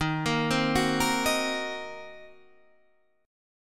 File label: D sharp Minor 6th D sharp down Guitar Drop D